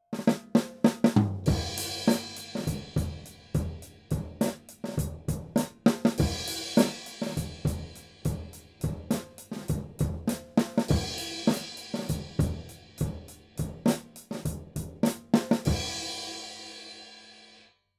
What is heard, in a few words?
drums stereo; akg 214